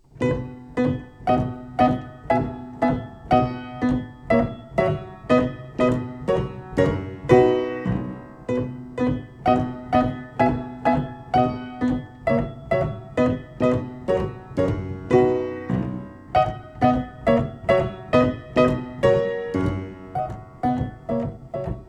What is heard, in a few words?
stodart upright, cabinet grand piano; keyboards; west-europe